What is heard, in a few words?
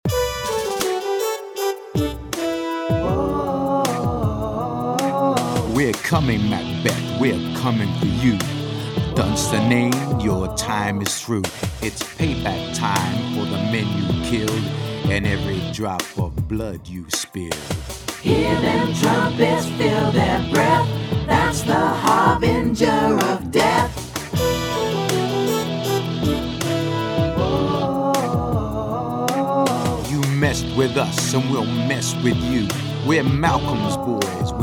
killing ground vocal; macbeth